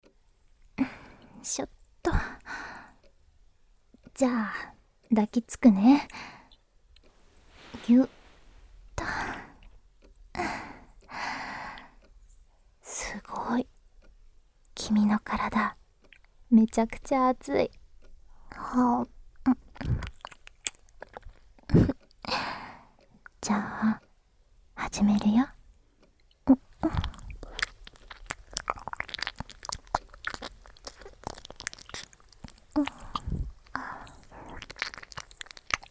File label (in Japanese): ヌルヌル耳なめ（左）